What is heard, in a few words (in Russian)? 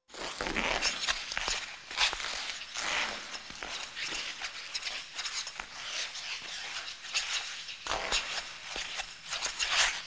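коньки по льду; Звуки катания на коньках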